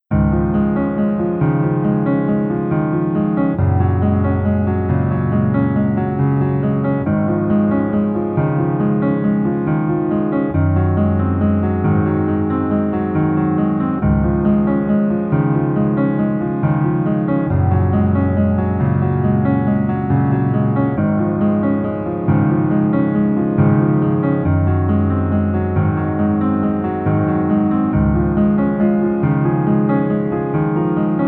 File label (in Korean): Piano ver 멜로디MR 남자키; 이태원 클라쓰